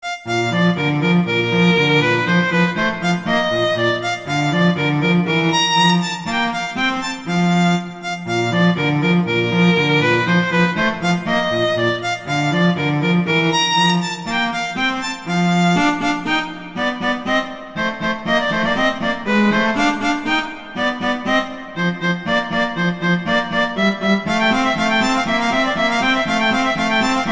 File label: Duet; Mozart; Duets